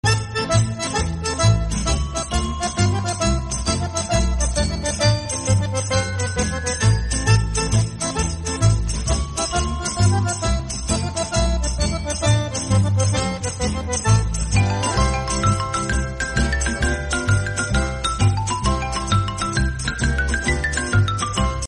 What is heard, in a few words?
village tarantella